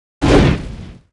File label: War Attack; Hellerwing; Mob